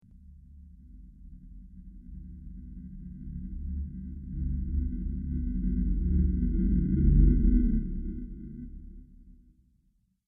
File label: Deep Space Motion, Science Fiction Sound Effects